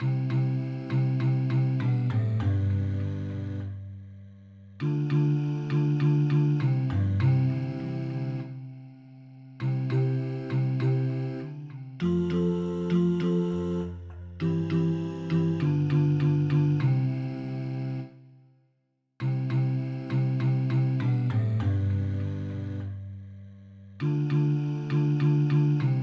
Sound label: Amen Siakadumisa bass